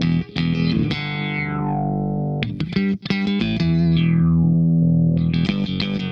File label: SHOT, Funk Guitar; 90 s Sample CDs; Zero G